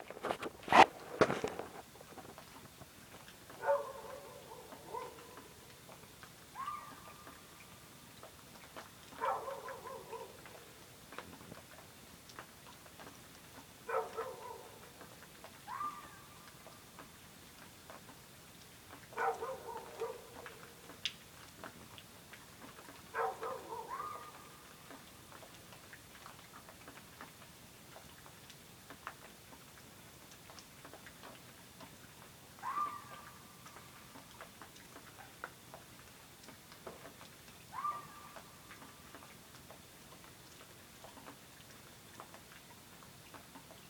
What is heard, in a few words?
odd call